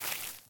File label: grass step; Original TK Sounds